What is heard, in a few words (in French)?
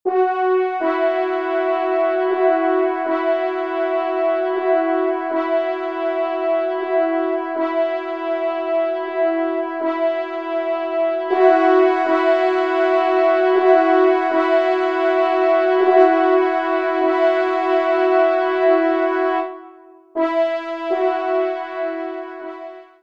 Cloches et Carillons